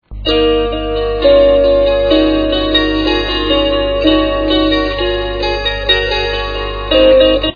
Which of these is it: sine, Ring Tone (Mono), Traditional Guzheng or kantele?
kantele